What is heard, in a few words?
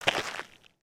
Foot Dirt Sneaker Walk, footsteps